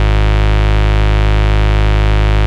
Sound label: Bass, Root G#; Vengeance Samples